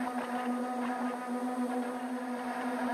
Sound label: Pvp start ambience loop